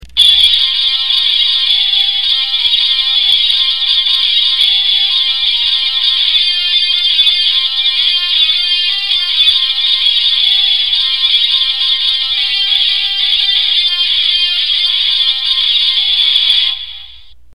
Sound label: GUITAR